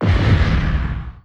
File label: distance tachyon lance fire, weapons; Stellaris